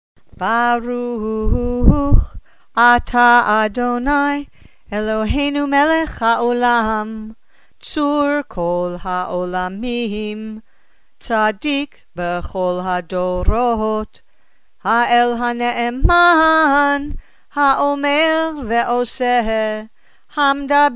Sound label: before Haftarah